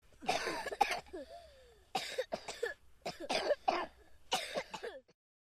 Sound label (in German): hustende kinder